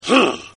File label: hit; Power Rangers vs Teenage Mutant Ninja Turtles - Ultimate Hero Clash 2; galvanax